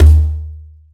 low mid tom one shot d sharp key, Royality free tom one shot tuned to the D# note.; tom drum